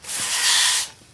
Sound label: sweep, hand mining